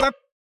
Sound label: roll prepare A; HeavenStudio; board Meeting